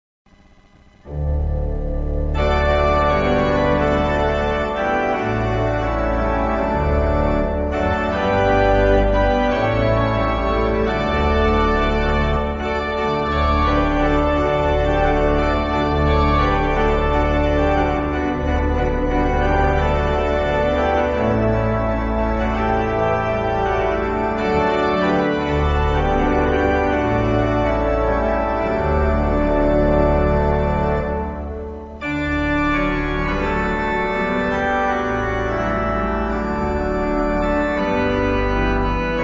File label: Organ; Antioch; JOY TO THE WORLD; Merry CHRISTmas